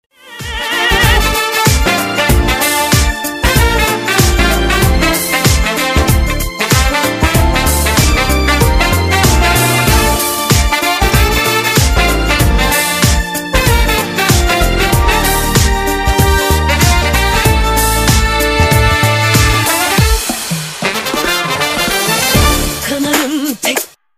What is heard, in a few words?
brass